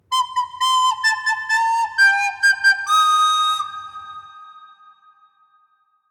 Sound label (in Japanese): リコーダー